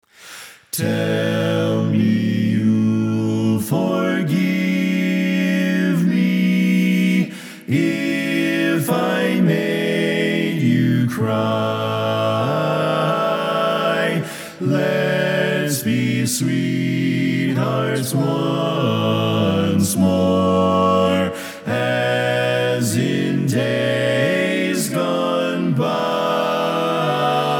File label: Bass Left